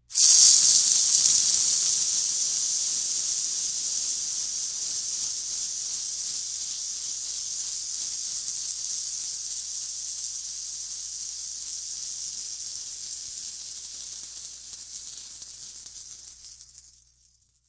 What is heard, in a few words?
CYCLONE SHAKER; shakers